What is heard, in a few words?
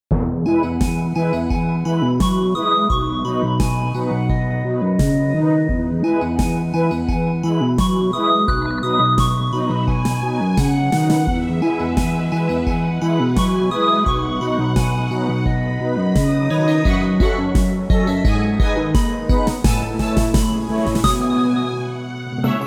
dream town, bgm